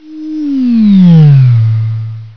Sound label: doppler